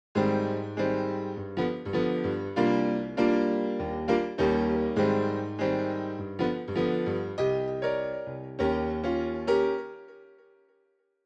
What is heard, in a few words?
Piano